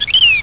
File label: Bluebird single chirp